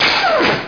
electro, weapons

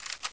ak47 bolt pull, weapons

